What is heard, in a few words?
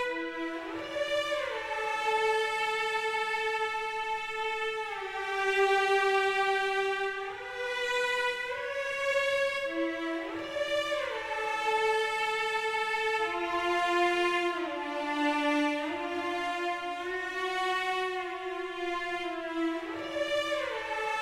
cello; Toki Tori Collection